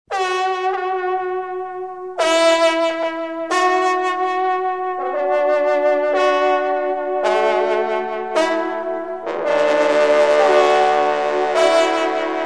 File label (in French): Carillon